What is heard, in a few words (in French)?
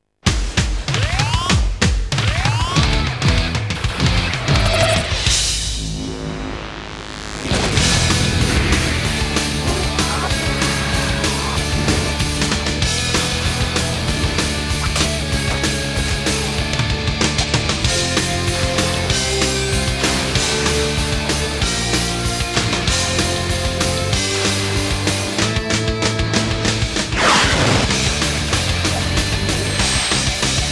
musique generique instru